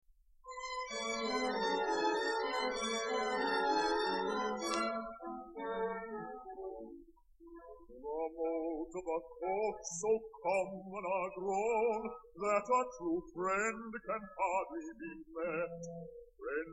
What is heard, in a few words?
air